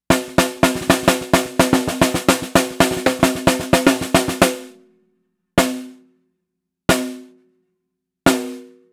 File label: ringy; troygrady